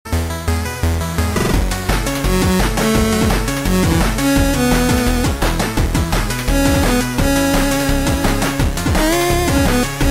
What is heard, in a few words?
chiptune mix